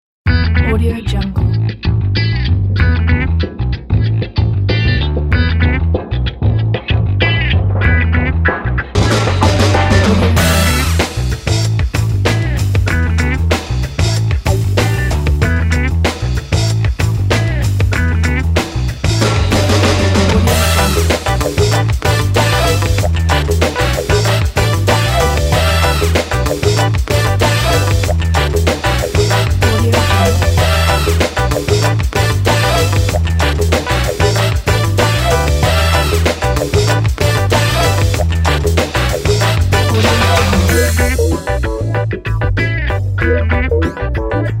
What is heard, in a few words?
funky funk